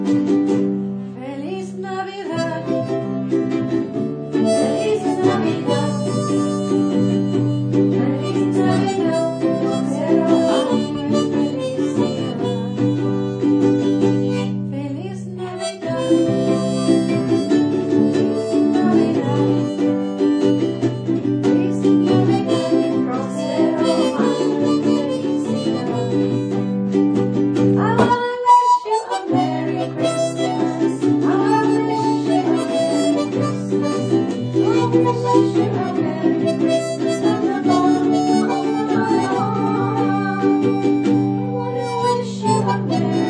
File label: practice; St Thomas More